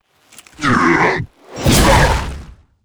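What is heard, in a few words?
ghost attack, monster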